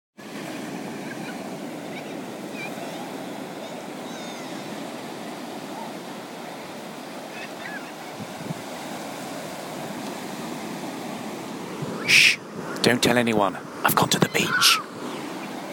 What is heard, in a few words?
beach